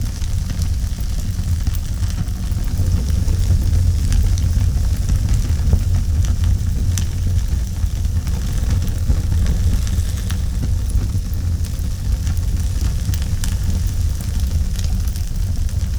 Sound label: Fireplace